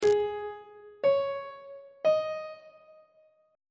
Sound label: g sharp suspended 4th triad chord intervals on treble clef